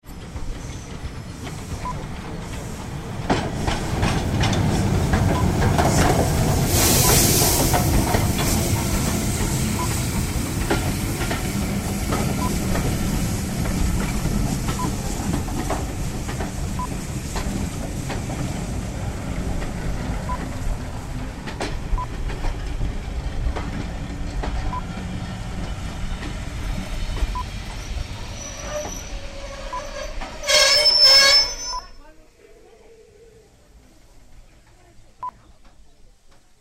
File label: Steam Train Arriving at Station; Watercress Line